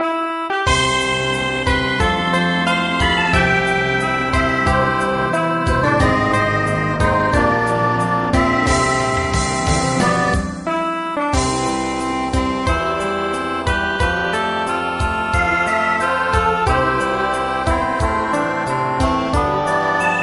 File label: hymn; praise